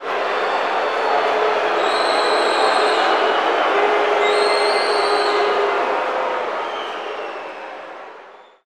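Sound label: basketball crowd jeer; SFX Kit; Crowds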